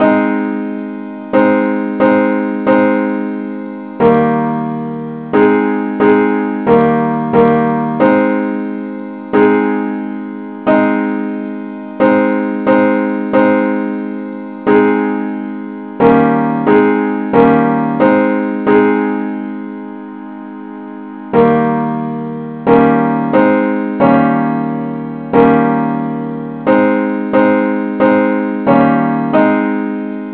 henley simple acc key aes

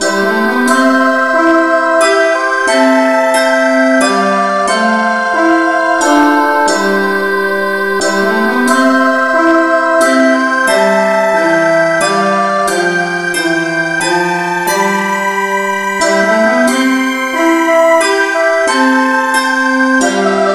no vocal key c

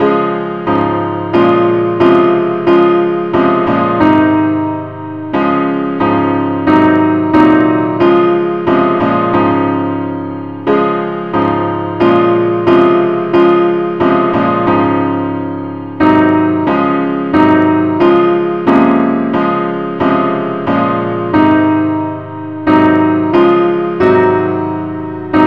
keydes; henley

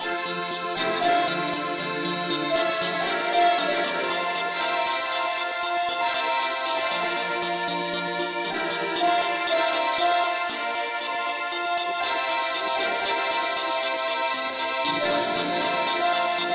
lead key F

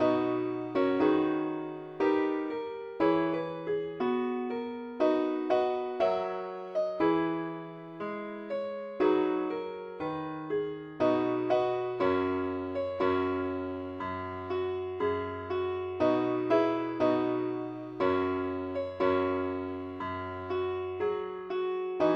pilot ukelele keyb

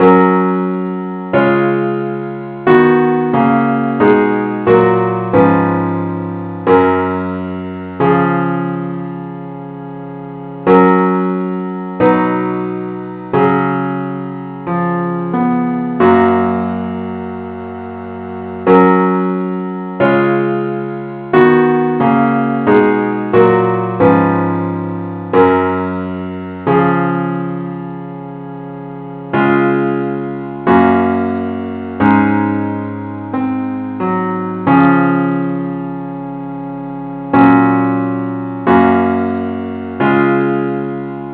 gwalchmai parts only key ges

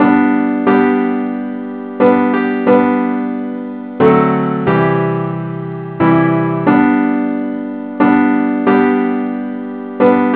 simple acc

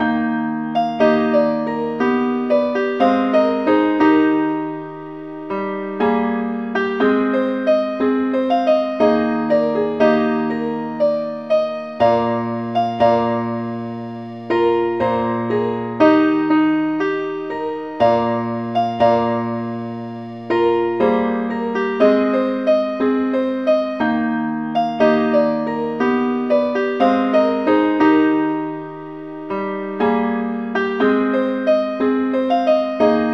keyboard Only key aes

